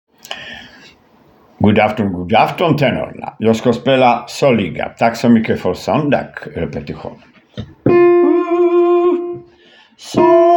tenor

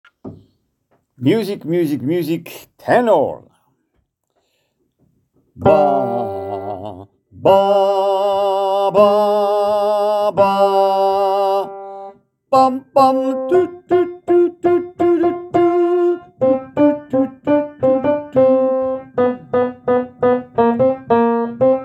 tenor

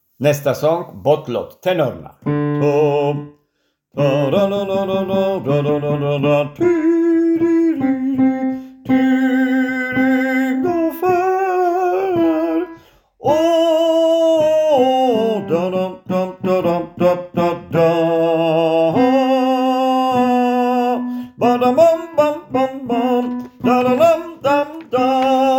tenor

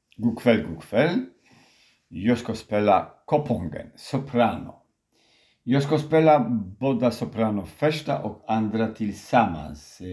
sopran

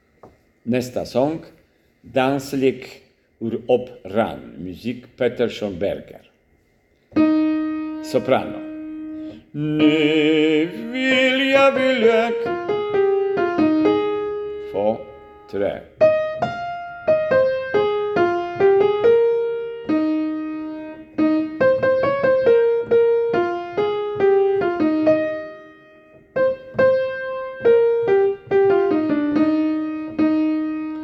Danslek operamusik sopran

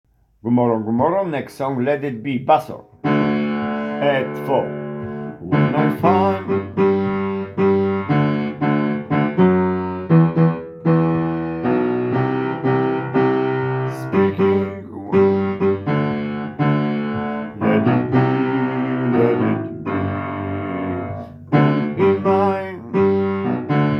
bas

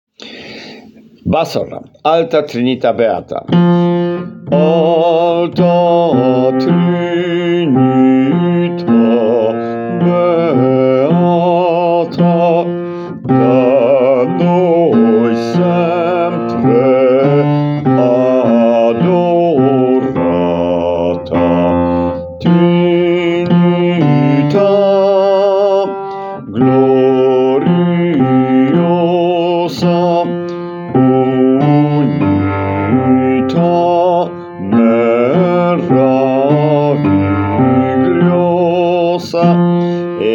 bas

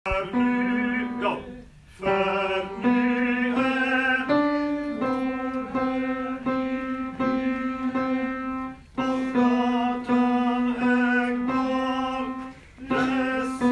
tenor

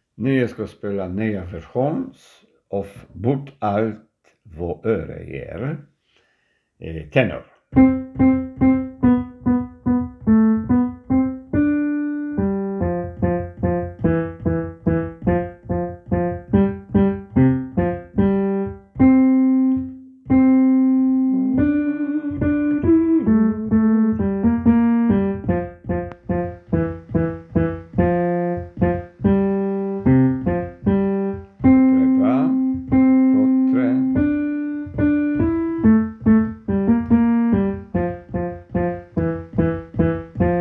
tenor

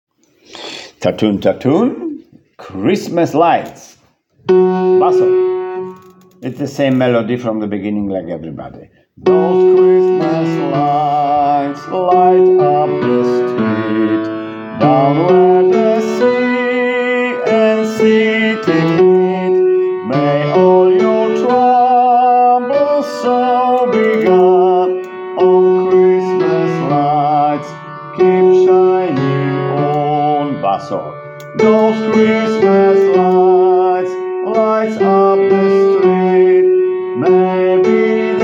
bas

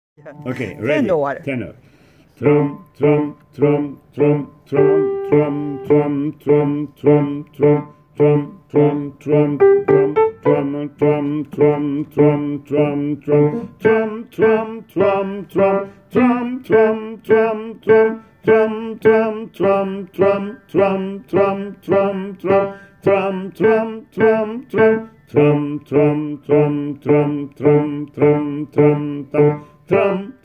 tenor

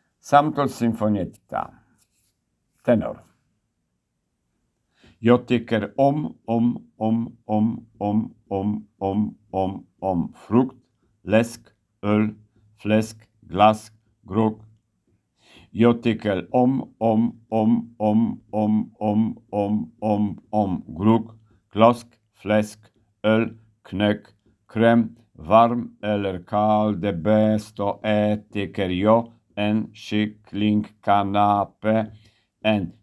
tenor

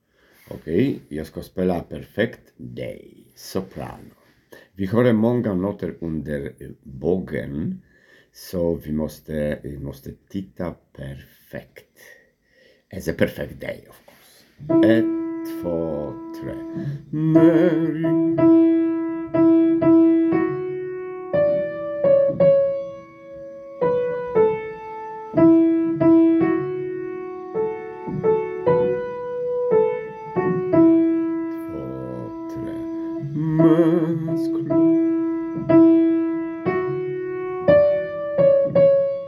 sopran

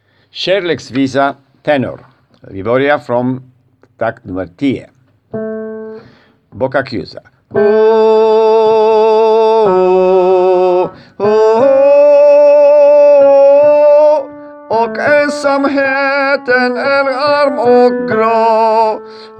tenor

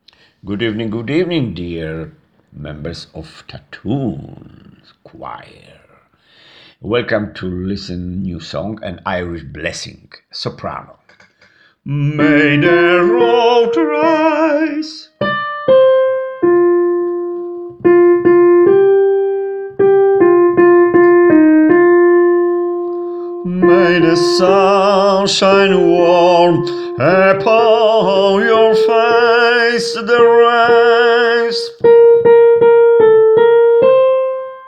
sopran

